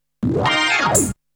Organ Slide

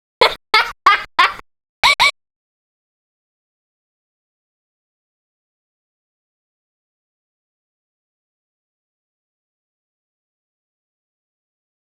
LAUGH

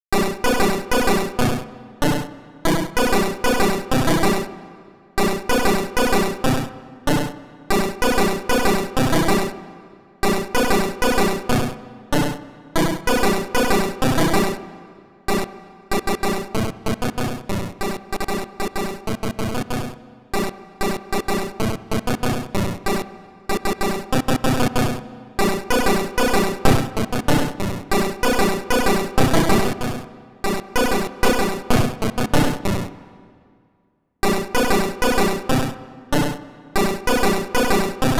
pulse

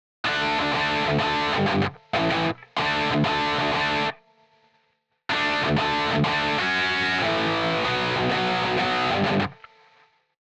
backg guitar

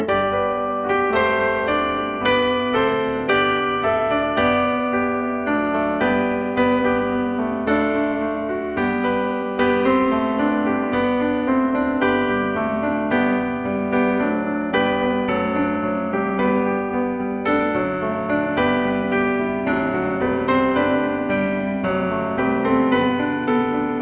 Beethoven piano

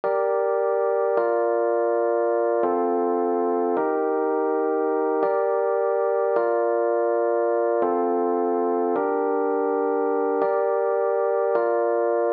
ELEC PIANO

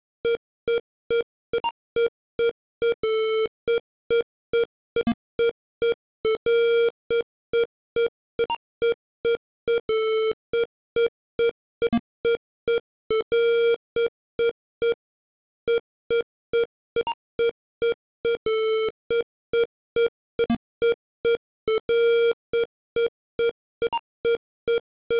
pulse

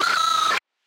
SCREAM - aaaaa

